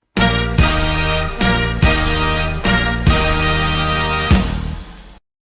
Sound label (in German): dreifach tusch